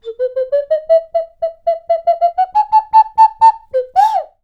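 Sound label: nose whistle, bird calls; Voice Crystal - Steve Reid Definitive Percussion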